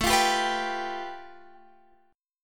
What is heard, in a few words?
A 7th Suspended 2nd Sharp 5th, Guitar, Half Step Down